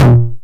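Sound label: 909 low tom, tape saturation; Toms; Goldbaby Tape808 & Tape909; The Tape 909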